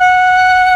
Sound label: SHIAO F3#